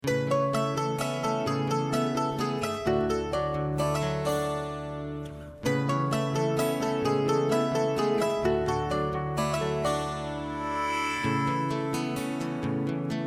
A No Backing Vocals, backing tracks

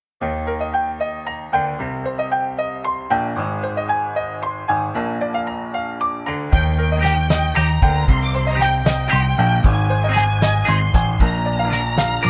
Eb No Backing Vocals, backing tracks

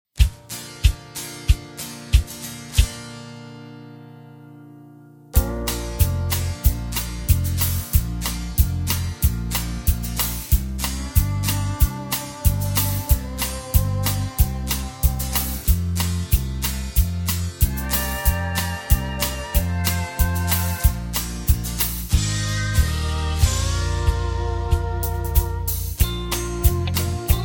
Medley No Backing Vocals Down 2, backing tracks

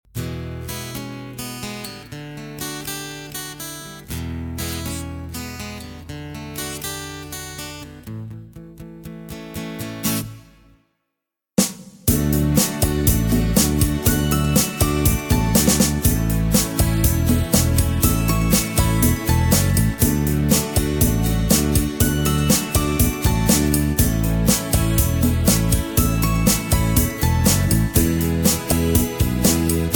(A) No Backing Vocals

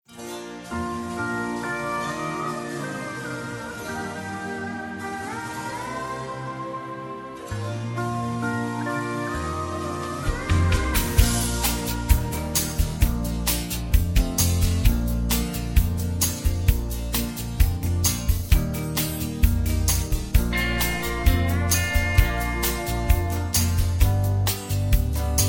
No Backing Vocals, (Bb To C)

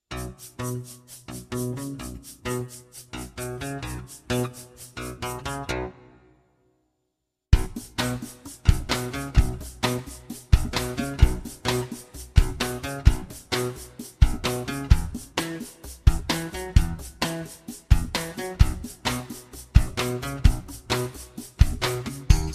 B No Backing Vocals, backing tracks

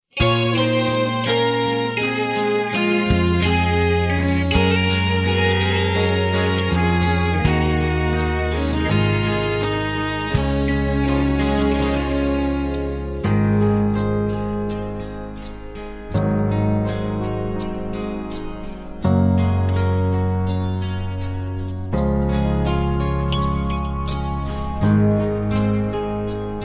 Db No Backing Vocals, backing tracks

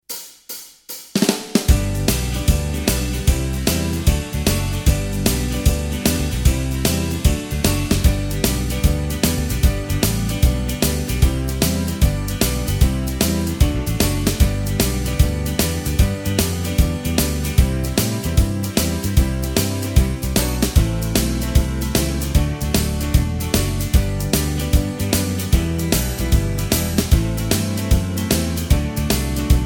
(G) No Lead Guitar

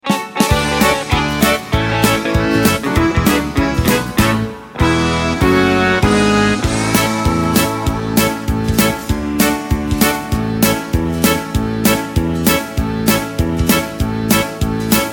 Country Music (A To Bb) No Backing Vocals

